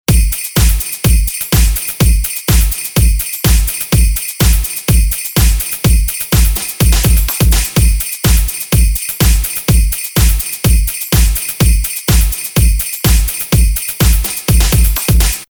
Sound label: Kick Beats; pack noel